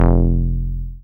BAS 303 Bass 5.3, Best Service Gigapack 2

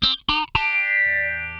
FAZE PLUK, Funk Guitar; 90 s Sample CDs; Zero G

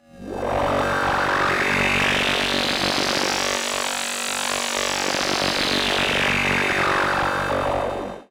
Odd Modular; radiosonics

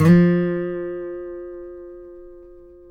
COLLINGS F 2, Guitars; Spectrasonics; Hans Zimmer Guitars VOL-2; FNGSTYLE PFM

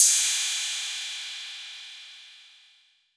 PERC 808; Wizoo - Platinum 24 Electronic; CHR PERC 808

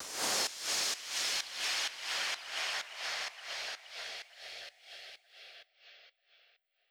FX Downlifter, 128BPM; Drum Loop; One Shots; VEC5 Multi Loops

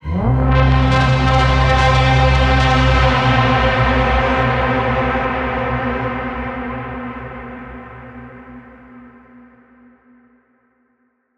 synth hit night howl C; Virtual Riot

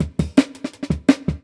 Jungle Breaks

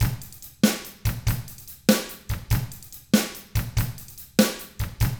rock 6/8, drums; ILIO; Double Platinum Drums 1

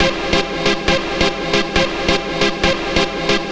NRG Lead, trance

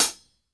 hit, hi-hats

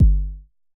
Basic Kick G1, Dirty Kicks, Vermona Kick Lancet; LookIMadeAThing Kicks

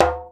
JEMB, DJEMBE; Univers Sons; Basicussions

